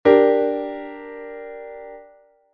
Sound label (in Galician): re-sol-sib; Que cifrado americano ten este acorde?